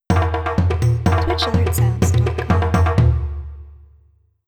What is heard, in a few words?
Jungle Subscriber